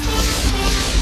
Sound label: HYDRAULI; Best Service; Advanced Mega Effects